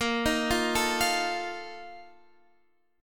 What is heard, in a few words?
Bb Major 7th Suspended 4th, Guitar Standard; arpeggiated